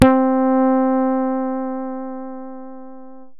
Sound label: Synths BASS